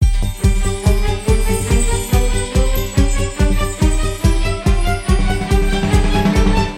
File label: stop bonus; Beauty Fairy